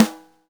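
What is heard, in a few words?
Snare, Roland MV-1200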